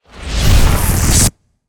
Chain Lightning Fire Ramp; Evolve; Elder Kraken

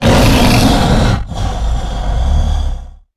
Pain Heavy; Evolve; Goliath

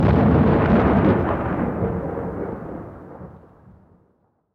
Lightning Strike Fire Strike; Evolve; Elder Kraken

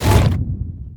Leap land; Evolve; Goliath

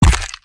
Melee Impact; Evolve; Wraith

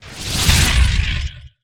Teleporter Clone Spawn; Evolve; Wraith

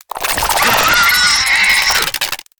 Spider Trap Activate; Evolve; Gorgon

